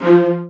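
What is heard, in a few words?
VIOLAS G3, Strings; ILIO; Synclavier Strings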